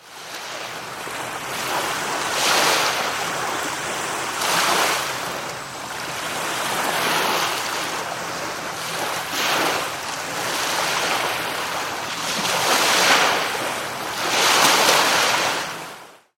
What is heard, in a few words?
medium waves